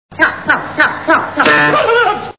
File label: nyuk nyuk; three stooges